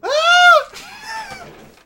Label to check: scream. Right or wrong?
Right